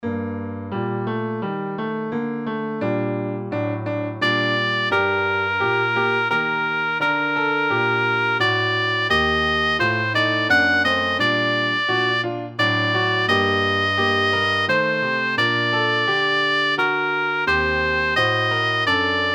Oboe and piano

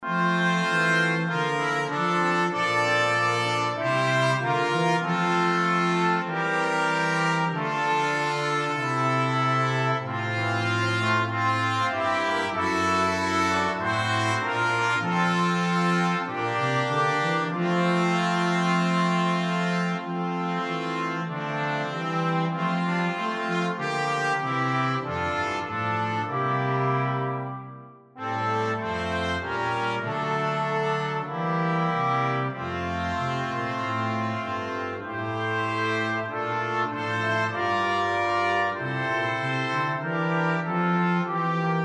brass quintet; Liedboek; Ik zeg het allen dat Hij leeft

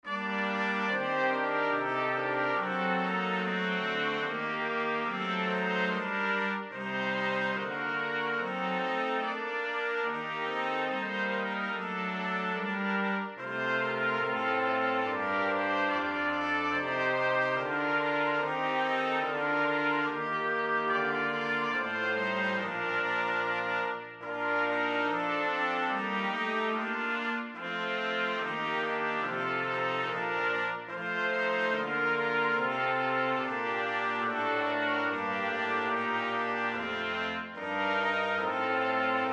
Brass Quartet; BWV 48.3 Ach Gott und Herr

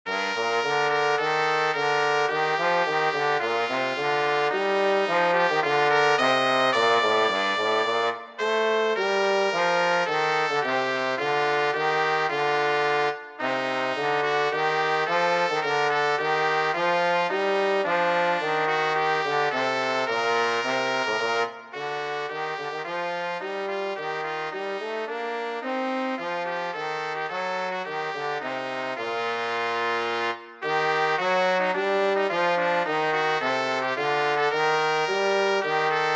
Brass for 2 3 trumpet and trombone

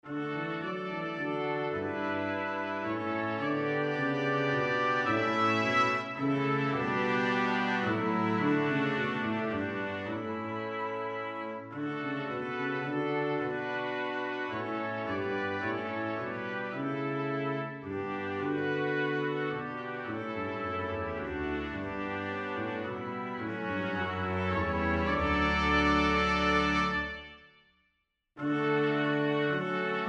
Brass Quintet; Liedboek; O hart spring op vol vreugde

